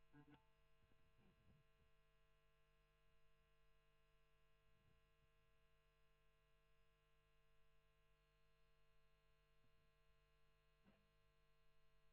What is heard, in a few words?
E.GTR Gefell